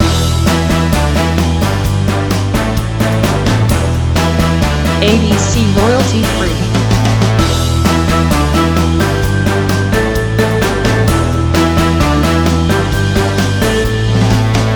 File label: spy loop